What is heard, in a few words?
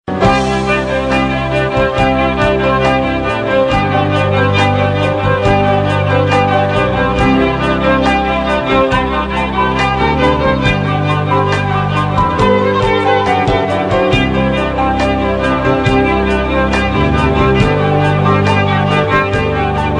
violin